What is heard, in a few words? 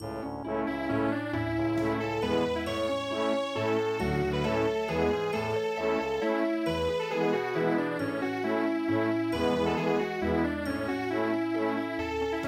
Maamme Laulu vienna waltz